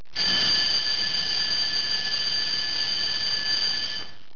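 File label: bell school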